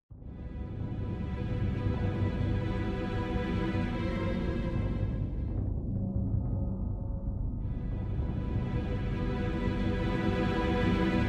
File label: melody ww 3 4 octave backing dry